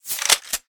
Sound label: Pickup Weapon; Gameplay Wpn